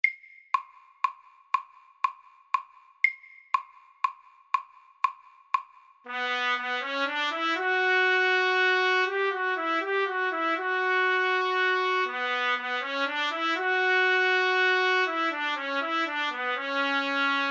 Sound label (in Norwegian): Etyde i Ciss moll MIDDELS